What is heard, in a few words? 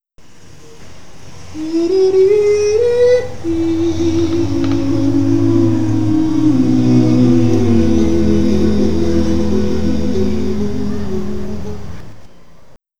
fedora login sample